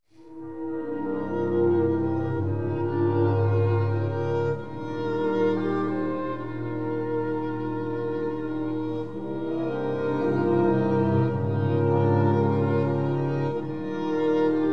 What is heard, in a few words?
Mandolin Concerto